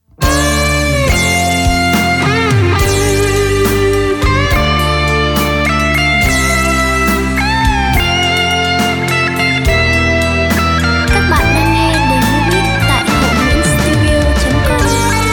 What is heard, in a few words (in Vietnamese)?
beat